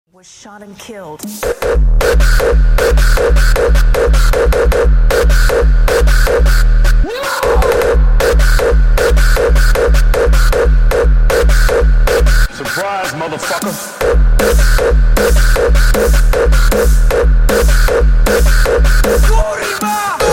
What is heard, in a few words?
r a w kick